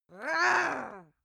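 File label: female aggressive, screams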